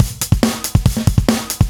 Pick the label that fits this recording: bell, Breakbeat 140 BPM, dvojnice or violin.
Breakbeat 140 BPM